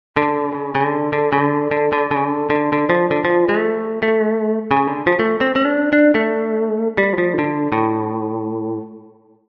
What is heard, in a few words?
EFX Strat; TURBO VL